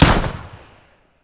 fiveseven fire; weapons; covert

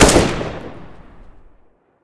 ak fire; weapons; covert

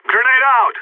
grenades; covert

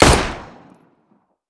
glock18 fire; weapons; covert

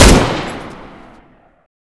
m249 fire; weapons; covert

